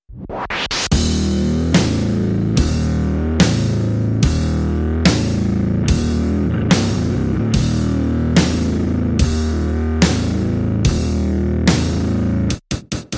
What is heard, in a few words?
Drums and Bass Only